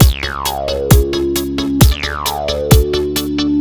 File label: hiphop, ringtones; Google; NewWave Labs